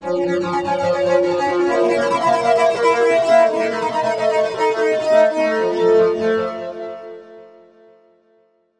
BOW BANJO TRM; eShop

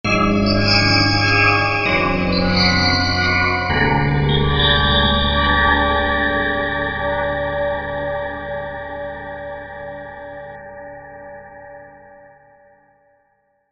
GONG

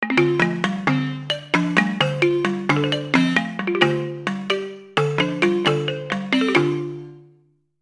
Balafon; eShop

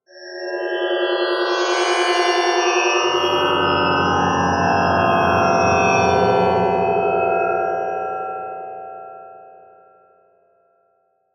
SCIFI; eShop

